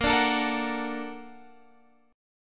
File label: Bb Augmented Major 7th Bb, Guitar Standard; Bb+M7 strummed; JGuitar